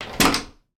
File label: household toilet cubicle door unlock